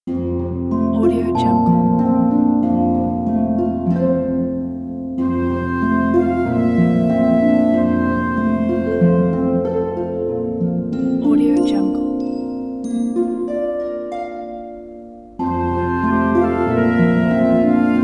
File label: fairy